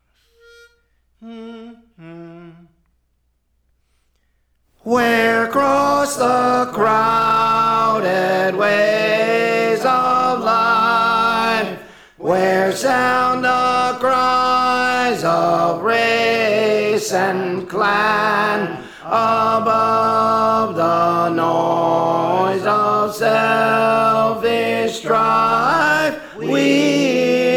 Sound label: Tenor; Praise For The Lord Training